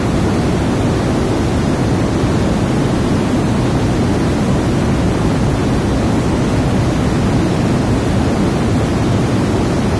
Waterfall; MapleShrine; mkxp; gameasync

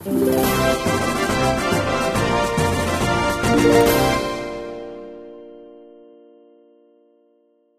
Victory; MapleShrine; mkxp; gameasync

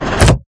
Switch; MapleShrine; mkxp; gameasync

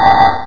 reflec; MapleShrine; mkxp; gameasync

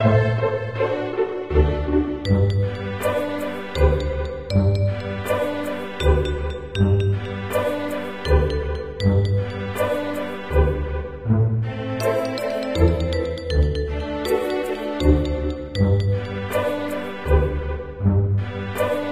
Town; MapleShrine; mkxp; gameasync

